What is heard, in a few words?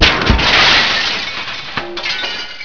GLASS